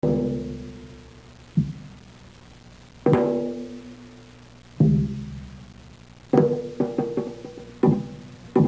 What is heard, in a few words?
changgo